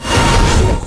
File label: Giant Bird; Lewd SFX